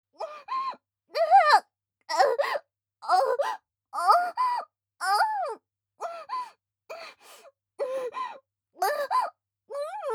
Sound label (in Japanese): 対面座位 喘ぎ ゆっくり; アスティリア